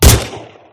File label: deagle, guns